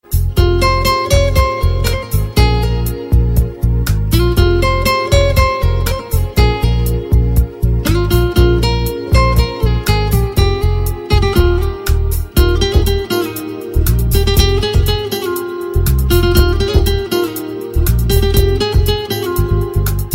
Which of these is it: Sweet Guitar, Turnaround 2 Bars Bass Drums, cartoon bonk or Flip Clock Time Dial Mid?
Sweet Guitar